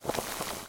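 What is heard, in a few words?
short clear rustling sound of water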